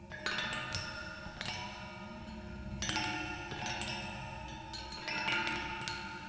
water; ウォーターホーン02; 不穏でミステリアスな音 Waterphone

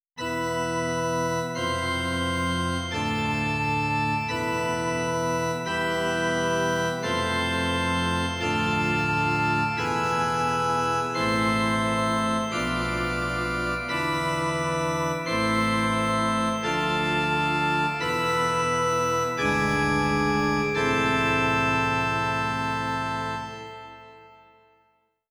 bgm inori; 祈り; 聖堂 教会 古城 悪魔 悲願 パイプオルガン

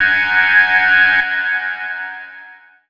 buzzer; 警報ブザー; 危険です注意！な感じのブザー ループ

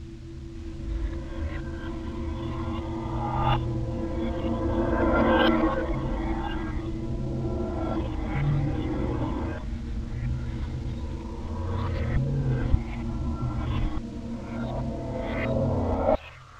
water; ウォーターホーン07; 不穏でミステリアスな音 Waterphone

